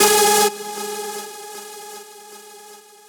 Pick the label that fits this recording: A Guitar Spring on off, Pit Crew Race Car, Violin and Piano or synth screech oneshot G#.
synth screech oneshot G#